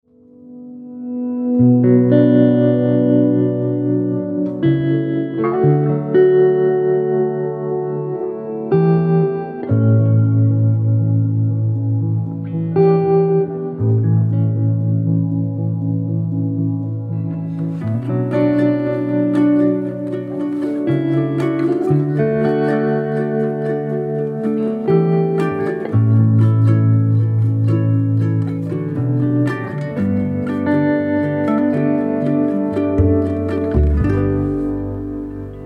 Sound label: Score Intimate Indie; Arian Charly